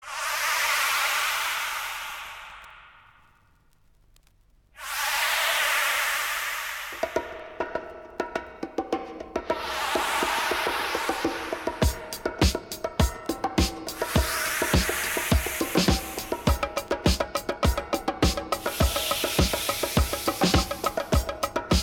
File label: DANCE RITUAL DRUMS POWER